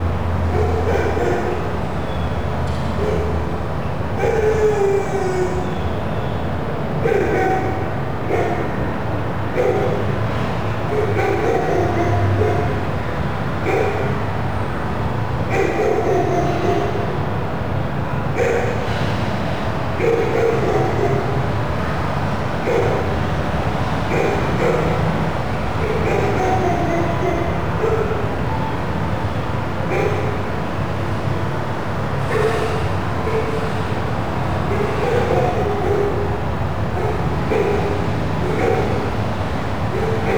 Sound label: city medium dogs; ambient; Project Gotham Racing